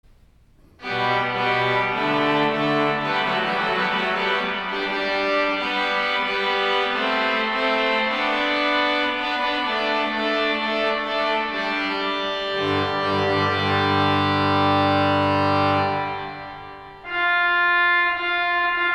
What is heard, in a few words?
Organ, Bridal March; St. John's Cathedral, Jacksonville Florida; Richard Wagner; 'Lohengrin'